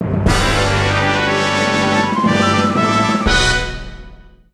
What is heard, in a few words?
Powered Up